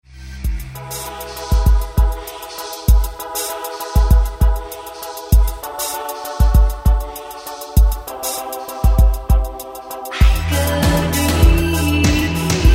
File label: distort